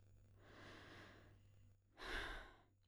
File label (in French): SOUPIR; Index des soupirs; Hadjithomas Joreige